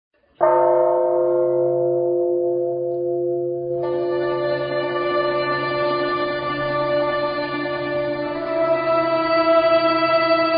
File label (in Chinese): 樂奏咸和之曲 無鼓聲; 撤饌; 樂奏咸和之曲二